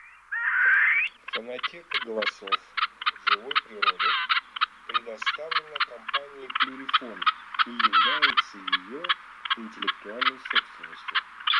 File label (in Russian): Куропатка сардинская Pernice Sarda